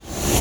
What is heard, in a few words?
letter slide